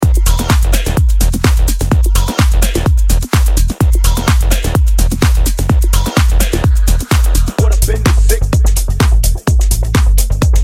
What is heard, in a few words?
Tech Groove Minimal Deep Tech